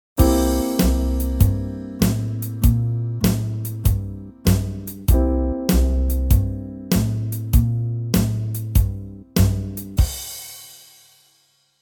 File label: No Bass